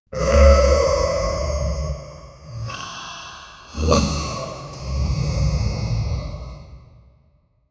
grognements d un lion, mammal

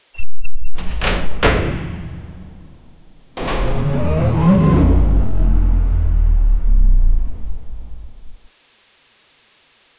bruit de porte futuriste; scifi

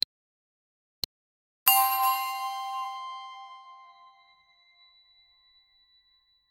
button

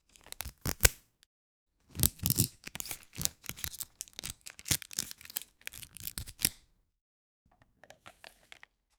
ouverture d'un paquet de, card

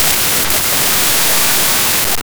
dice

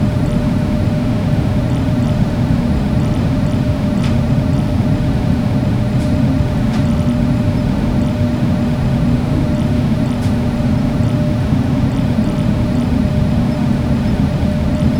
quelque chose qui marche; mysterious

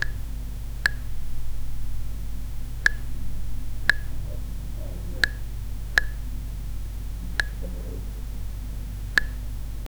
un son de bl, blunt; sound effect

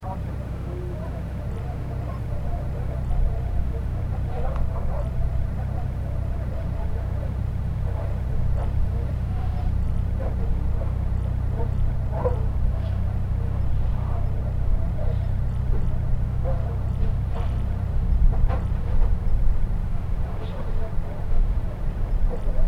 ambiance sur vieux bateau; sound effect; ship

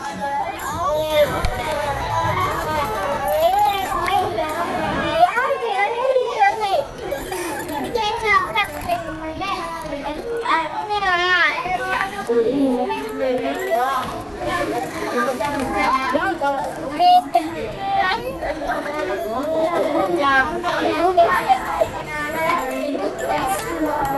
ambience de classe denfan; india

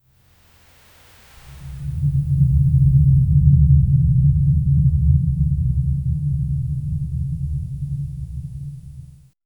je veux un son mysterieux; mysterious

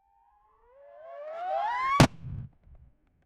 bruit de motocross, sport

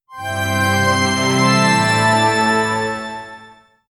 un logo sonore

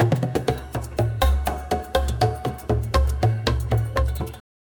vibes marocain cuisine

